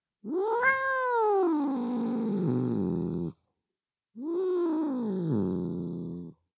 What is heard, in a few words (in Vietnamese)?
tieng meo gam gu